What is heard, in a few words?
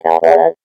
Moonface Voice